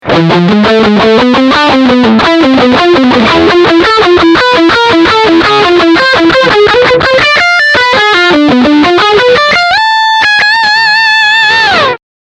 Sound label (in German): Lick Steghumbucker